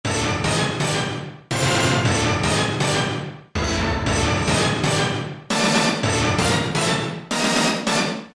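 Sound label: Orchestra, Yamaha TG500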